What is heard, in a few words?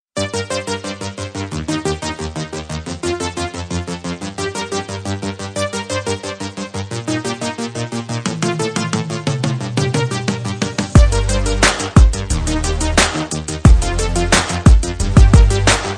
Best Hip-hop Ring